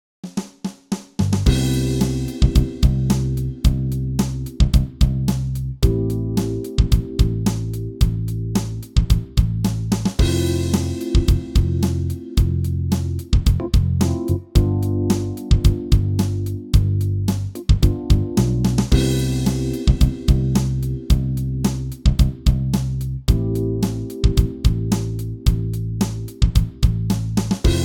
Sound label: Jam Track C Am F G